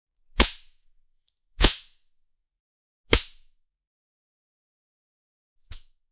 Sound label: volleyball spike